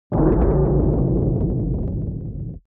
sci fi electronics protective shield hit absorption rattle